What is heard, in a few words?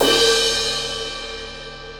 18 inch crash crash, cymbal; k custom session; Zildjian